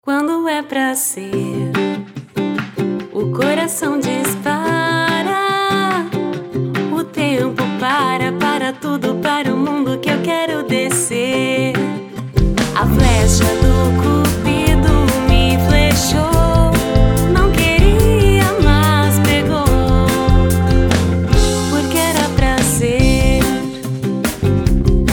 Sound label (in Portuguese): Jingle Namorados sem loc